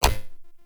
UI Theme Drag End; Corpus

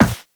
Run Metal, Footsteps; Warframe; Characters; Operator

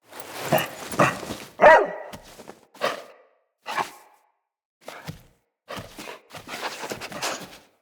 Quest Wolf Double Cub Rescued; Animals; Wolf Cubs; Rescue Cins; Orengal

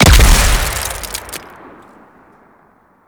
Ice Grenade Explosion; Eidolon Elite Rifle Lancer

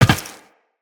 Tenno Land Glass, Footsteps; Characters

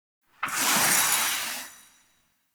Foley Idle Rabbit Pet; Ambience; Gameplay; Teshin; Warframe; Duviri

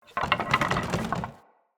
Cage Rattle; Avakot Ode; Level Events; Midrath

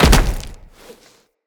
Tenno Land Heavy Rock, Footsteps; Characters

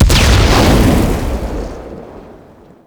Generic Explosion; Warframe

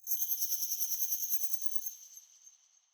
Under City Bat Chatter Distant; Ambience; Dungeons

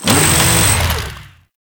Saw Melee Swing Long, Weapons Melee; Warframe; Grn Ghoul Saw Player

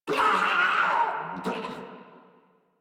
Death Crewmen; Warframe; Corpus Crewmen; Crewman D

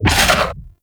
Fairy Dust Impact

